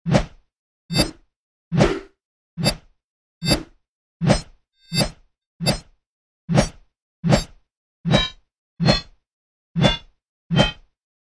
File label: Shredder Claw Or Small Swing W And Without Ringout Movement Sounds